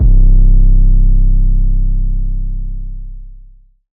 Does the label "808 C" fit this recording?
Yes